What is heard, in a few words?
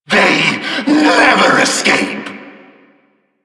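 Vocal Investigating Projected; Enemies; Vocals; Warframe; Duviri; Dax; Vocal Set B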